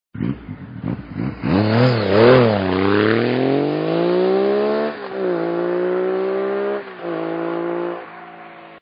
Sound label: Triumph Daytona In-Line 3 cyl engine sound; 2002